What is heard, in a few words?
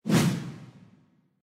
Transition slide; Majhong Royale